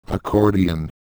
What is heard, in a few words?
accordion